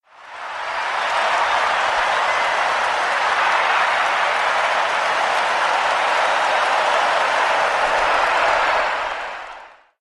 Huge Crowd Sound

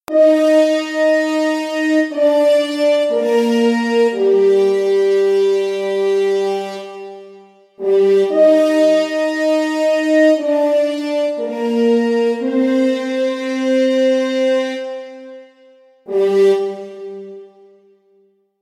Orchestra Horns

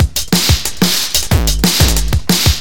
Hard Tek Step DnB

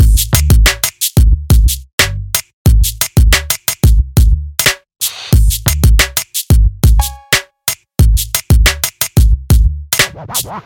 HipHop RnB Heavy

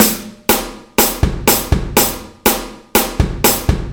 Motown Groove

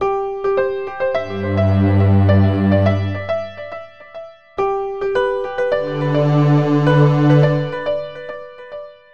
Orchestra 105bpm

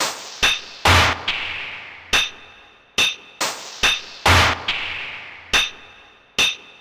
Hard Metallic Perc

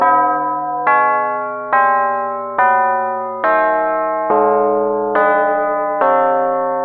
Halloween Bells

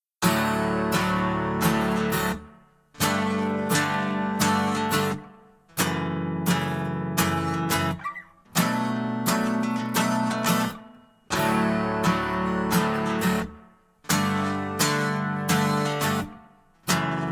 Guitar Loop

